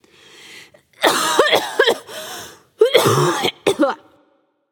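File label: clear throat, female; smite; voicepack; hera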